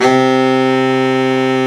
BARITON SAX

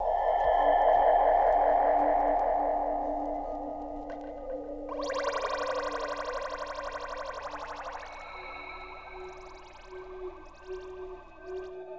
80 Dmin, Rhodes; Hip Hop & RnB 3

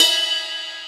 Ride, E-MU Proteus-2000

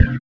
808 Snare, Kit

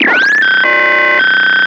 FX, attacks; Best Service Advanced Media Trax Modern Composer

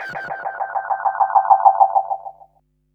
bass delay fx; EZRollers

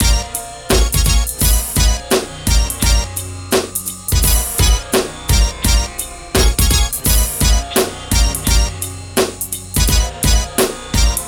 MIX, Hip Hop and R&B Drumloops; Best Service ProSamples vol.01; AKAI

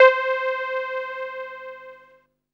SIEL, String Machines

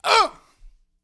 Vocal, 70s Analog Funk

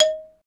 MLT XYLO

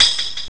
tamborin; Amiga Soundtracker Sample Packs

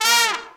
SECT BRAS FALL

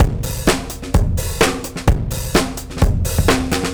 Jazzy Drums 128; musicradar

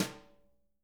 BRUSHES, Living Drums; Sampleheads; Peter Erskine Living Drums

